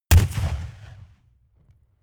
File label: rifle ak-47 exterior fire single bass; comedy cartoon; pistolen gewehre; Pistols and guns